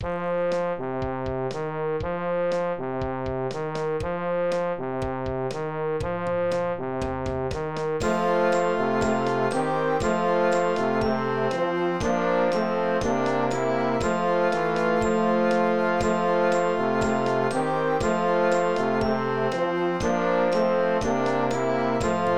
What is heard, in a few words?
percussion, 6th Grade Band